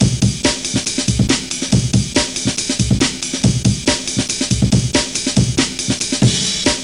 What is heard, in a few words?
amen break